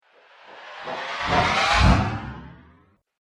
Dance Riser; Rise And Dive Sound Effects; sound design

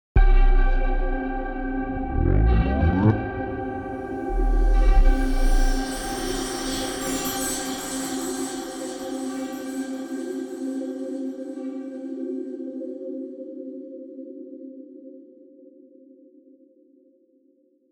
Bed Suspenseful Musical Bass Hits Chimes; Blastwave; Composition

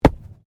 Body movement Organic Impact Medium; Body movement foley to simulate falls and deaths; Wrestling And Struggling

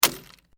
Glass movement minor

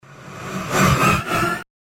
Industrial Ghost Tension; horror sound effect; Ghost Sound Effects

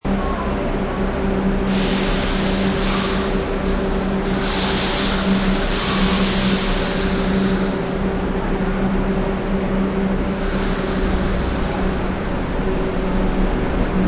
Subway Departing, Subway train departing.; Subways; Vehicles

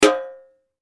Impact Can Metal Hit Ring; Sound Effects:Materials; Blastwave

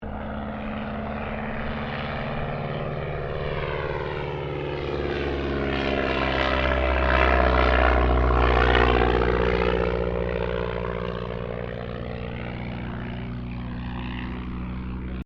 AIRCRAFT DH82A DEHAVILAND TIGERMOTH PASS

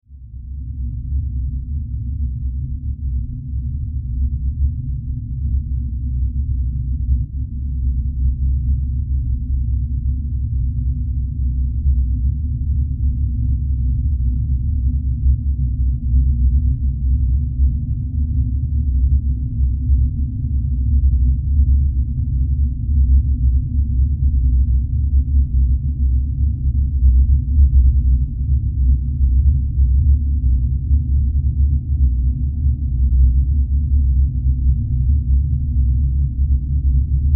Horror Drone Deep Rumble; Evil Sound Effects; Evil (Sound Effects:Horror)

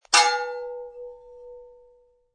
Metal Clang, Metal clang sound.; Clanks; Objects

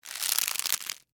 Wood Twist Handle, Stress; Foley; Sound Effects:Materials; BlastWave

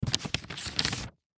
Envelope Remove Letter; Office; Blastwave

